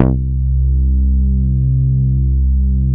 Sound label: VCO bass C3; SE-1 BASSES